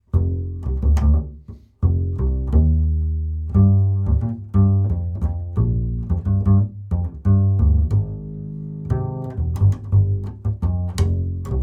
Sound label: bass; TUBE